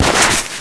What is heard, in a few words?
grab ball, soccer; jb engine